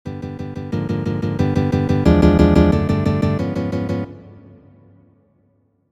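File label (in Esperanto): Studo pri ripetitaj kvar-voĉaj akordoj; Studo 4-a; Villa-Lobos esploras tiun grandan riĉecon harmonian irante laŭ interesaj kadencoj kaj moduloj senpretigaj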